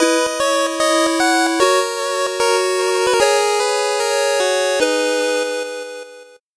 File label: 8bit jingle slow